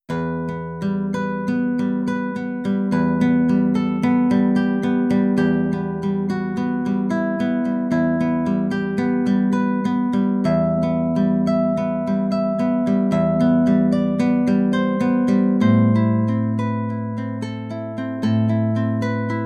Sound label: Spanish Romance; Bilbao